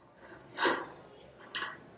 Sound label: Bolt Lock